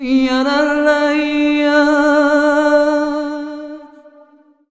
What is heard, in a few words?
VOX, Balkanish Traditions